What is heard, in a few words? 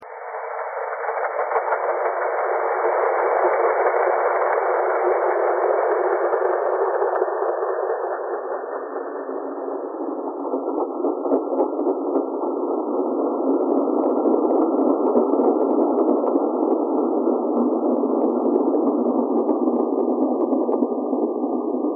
thread noise